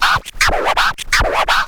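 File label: SCRATCH TOOLS; AMG Megabass REMIX! VOL-2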